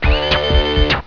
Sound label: elec pitch